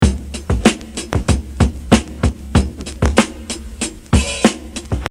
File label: 95 bpm 16ths